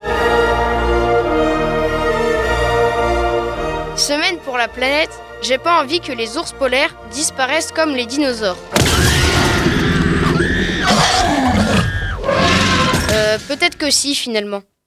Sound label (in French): JINGLE DINOSAURES; Cap Au Nord; Cite scolaire Borceliande